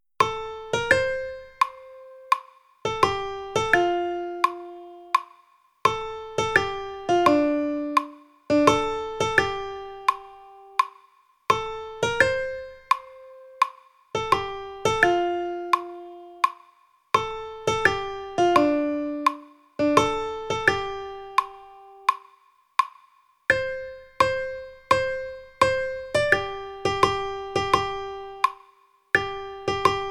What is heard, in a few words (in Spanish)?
flauta